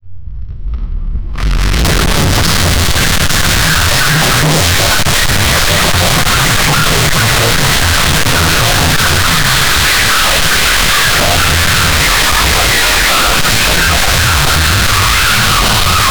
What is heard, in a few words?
ultimately bass boosted